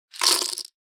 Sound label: game end